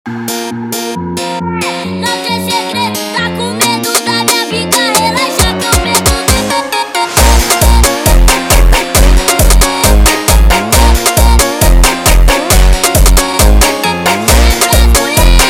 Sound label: Funk